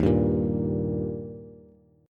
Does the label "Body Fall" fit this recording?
No